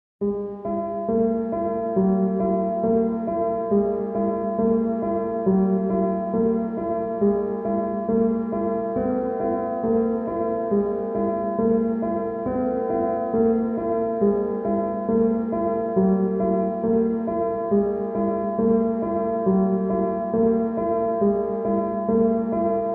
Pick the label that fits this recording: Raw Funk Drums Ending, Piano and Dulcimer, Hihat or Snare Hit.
Piano and Dulcimer